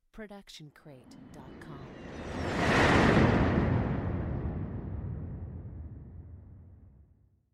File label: plane; soundscrate